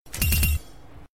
Headshot 3x

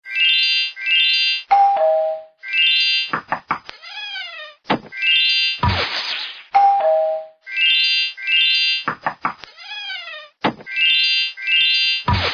Ring Tones; Yahoo

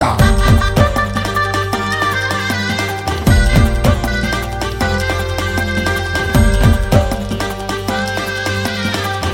Chakdol Instrumental

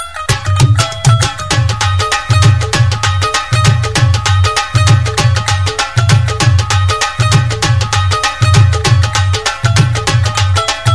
Dholak Mix

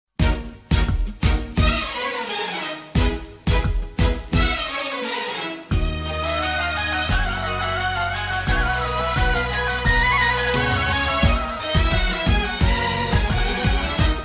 Ringtone Intro

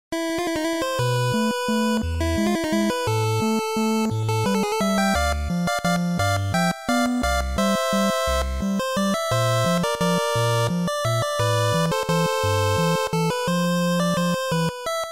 8bit theme

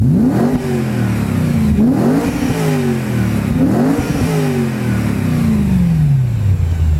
Bmw E90 M3 Exhaust

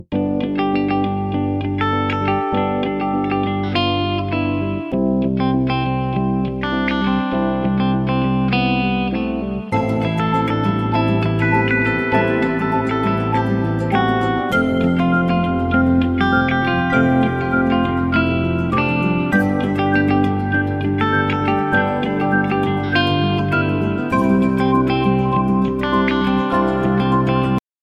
Soft Guitar

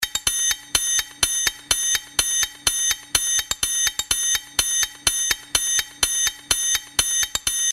Sms Tiinn

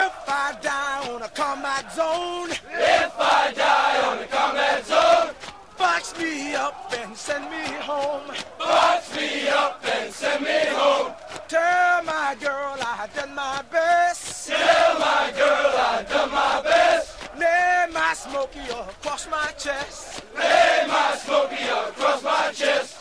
Us Marine Cadence

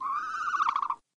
Dilophosaurus

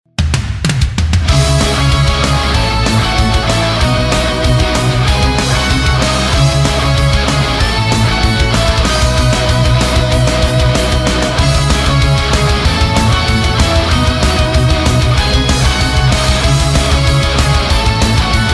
Heavy Metal

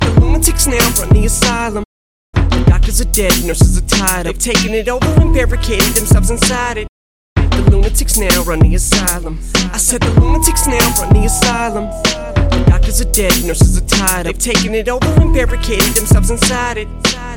Rap

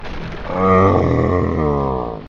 moan

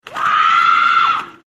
Rollerblade Scream

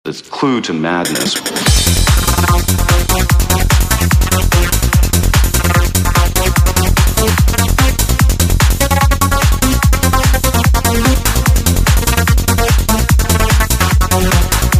Techno Trance

